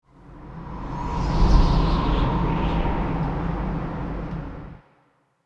Ghostly scary presence suspense eerie; horror

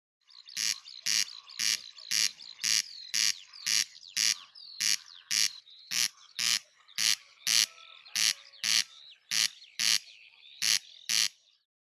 Corn crake crex crex singing

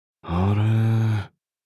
Thinking hmm anime villain vocalization

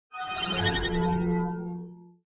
Cybernetic futuristic robot scanning

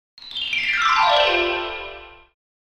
Cartoon xylophone descend gliss

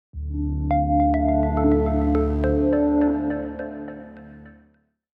Cinematic minimal logo intro

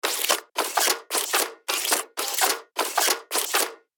Animal RC toy movement mechanical; rc toys

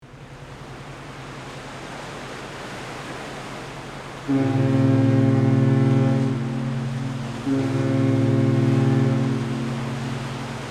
Ship passing by fog horn distant perspective

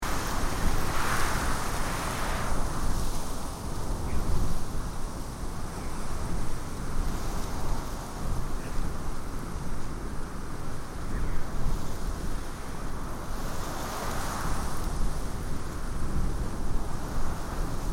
Strong wind gusts wind blowing ambience

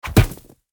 Snowball impact hitting wood

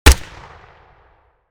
SPAS-12 combat shotgun single shot

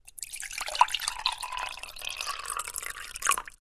Pouring water

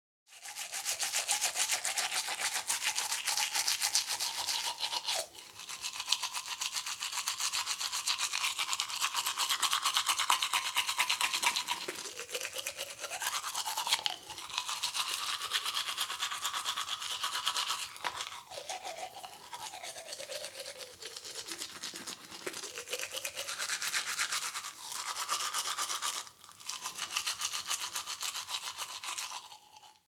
Human brushing teeth close perspective

